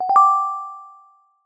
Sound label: chime, notification sounds; zulip